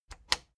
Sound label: plastic button latch scratch disable fast